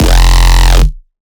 Riddim Bass Hit F; Aztec

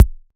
Kick, Dubstep 808 Kicks; GhostHack

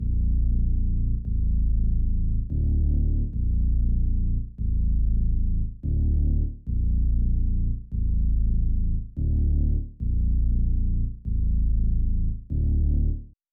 144 BPM D Min Bass, melody loop stem; Cymatics; 2022 Melody Collection; Wavy; Jupiter

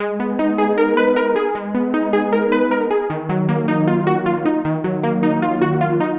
Various Melody Loop - 155 BPM D# Min Synth; Melodies; Loop Stems; Cymatics; Oracle Sample Pack

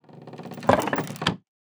Item Wooden Chest Open or Close; Game Essentials

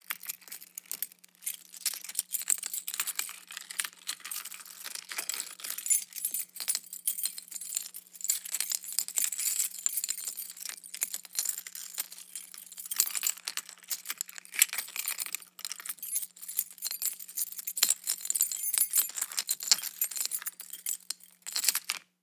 Foley Metal; Loops; Ghosthack - Color Bass Essentials

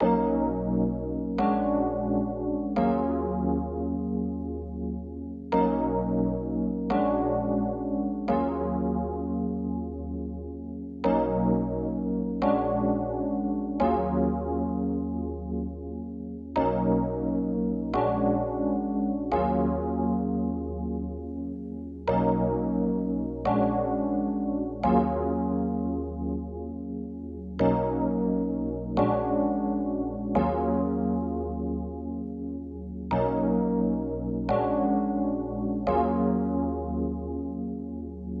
87 BPM D# Min Chords; Stems; Cymatics; Around The World

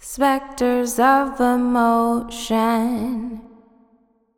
80BPM, Female Vocals, Wet; Spectres of Emotion; Ghosthack Advent Calendar 2017 Day 1

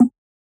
Vintage Percussion Doot; Cymatics; Mystery Pack Vol 4

